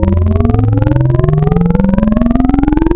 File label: Vocal Bright Vowel, Wavetables; Synthetic's Serum Collection; ESW Core Wavetables; Echo Sound Works Core Tables